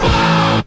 Stabs

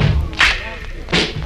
clap and stomp; Drum loops

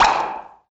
slap, Misc Percussion Pop

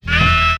saxed, stabs

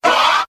"huh", group of men

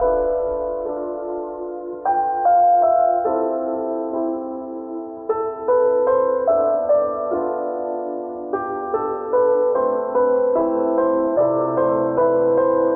piano, Loops Sad

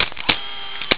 camera

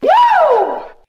"woo", voice

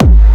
Kicks Electro

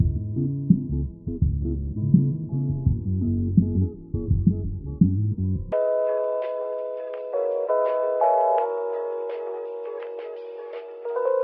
keys, phonky loops

